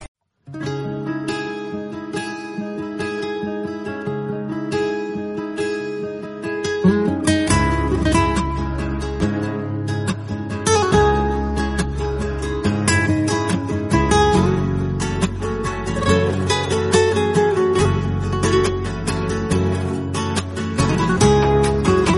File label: romantic guitar